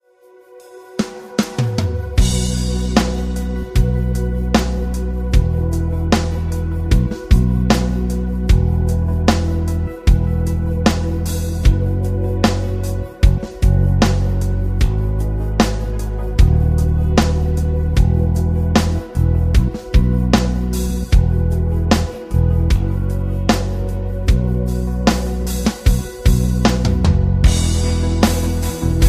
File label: rhythm